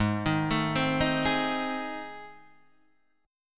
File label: A Minor Major 7th Flat 5th